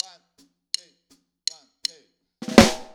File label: Snare, 162 BPM; drums